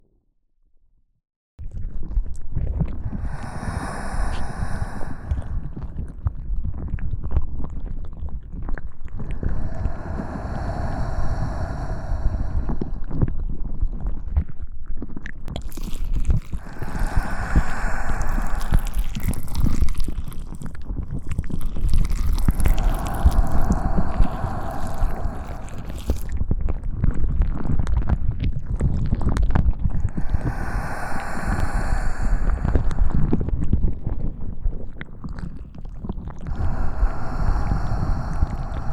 吐息＆触手片耳づつロング; 触手吐息耳舐め呼吸ロングループ; みみサキュIX

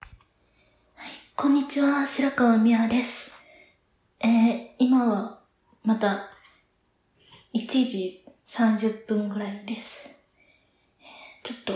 おしっこ実演, お風呂で立っておしっこ; Pee.3おしっこ録れるもん。; おしっこラボ; 日常/生活 失禁 女性视角 特殊癖好/变态 放尿

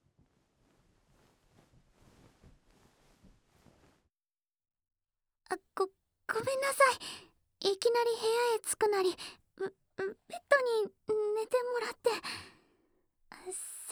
耳のコリは電動マッサージ器で解決！, 電子ノイズ耳かき; ウイッチズ・エルミタージュ 雷電の魔女のビリビリスパークヒーリング

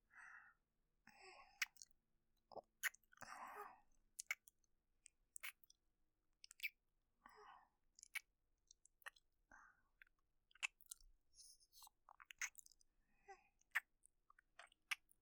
キス; 【マゾハーレム】

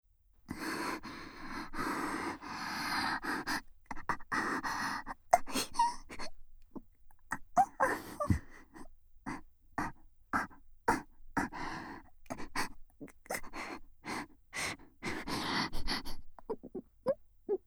耳元密着吐息＆喘ぎループトラック, ASMR; 萌声

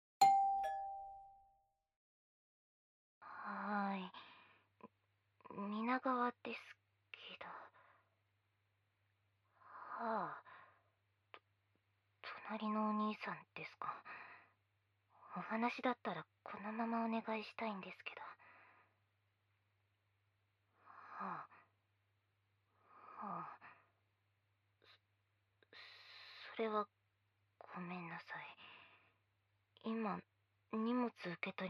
ぐっぽり耳舐め; 隣の陰キャ大学生; 【KU100】欲求不満な引きこもりダウナー陰キャJDのヤリすぎ耳穴レイプ!

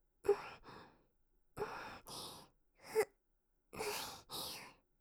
手コキ喘ぎ声; カスタマイズ用音源

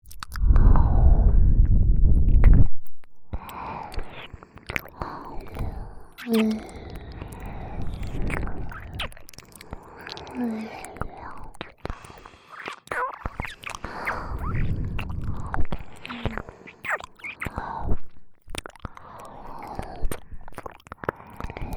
両耳同時耳舐めループ; 座敷牢の鬼姫; KU100

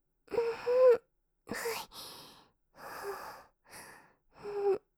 喘ぎ声(吐息多め); 手コキ; カスタマイズ用音源

